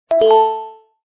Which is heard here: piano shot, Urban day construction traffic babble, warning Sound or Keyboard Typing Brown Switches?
warning Sound